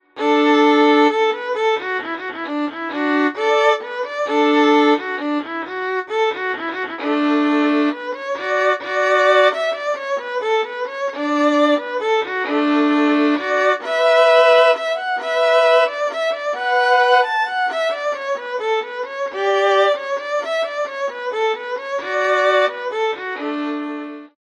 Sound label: Strings, Fiddle; Synthphonica; Syntheway